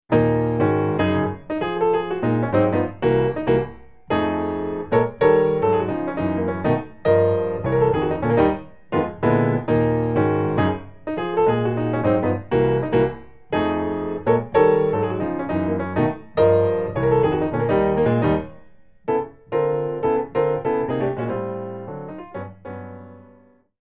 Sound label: piano solo